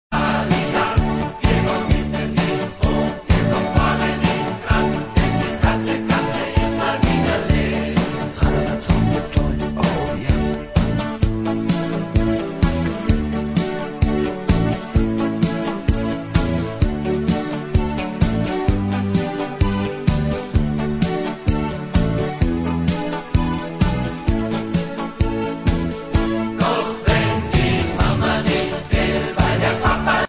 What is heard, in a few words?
instrumental